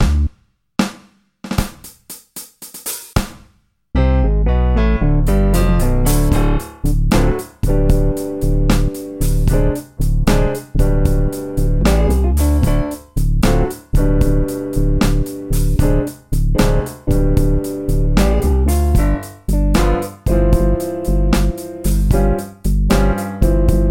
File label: slow blues